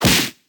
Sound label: Punch short; Fight Knight